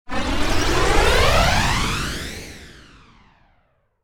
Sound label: Slide Back Whoosh; DJ sounds